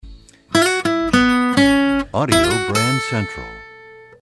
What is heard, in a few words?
Desert Wind, radio jingles